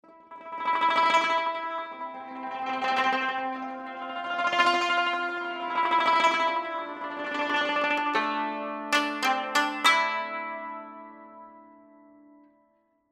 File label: Yangqin Ensemble; Phoenix Orchestra